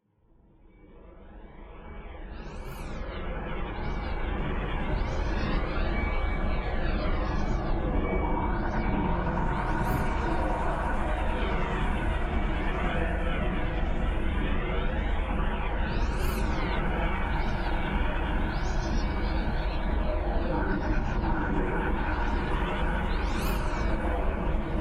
distorted world, STRANGE WORLDS; Leslie Reid

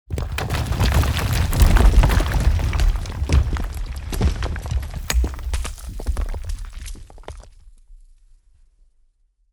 rocks stream down heavy; Alexander Kopeikin - Rocks

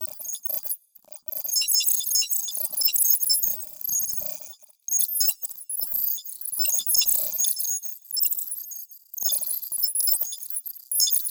Modular UI Calculations and Text; SoundMorph - Modular UI - By Richard Devine

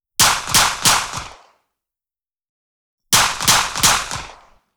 Sig P229 9mm 50m behind clean Triple shots x 2; Ehrlund; Pole Position Production

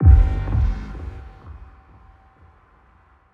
Boom, Trailer Booms; Effective Trailer Booms; Federico Soler Fernбndez

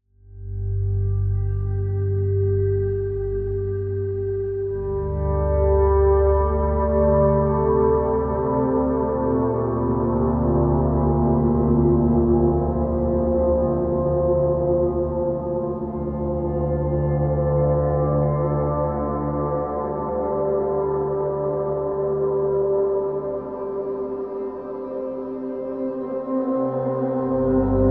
Melancholy Texture D; SoundsArchaic; Melancholy Textures

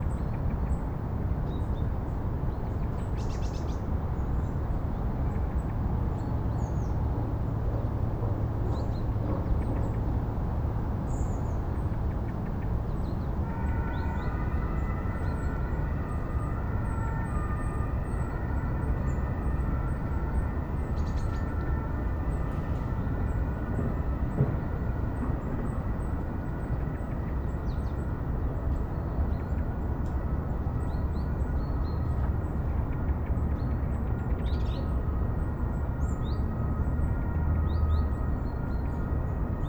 Distant City Ambiences; Detunized

